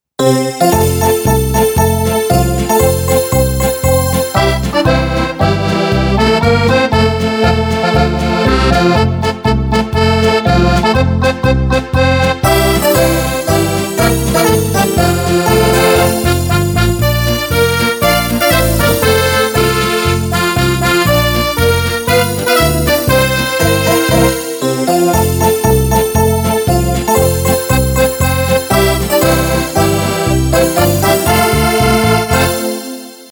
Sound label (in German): Schlager Trompete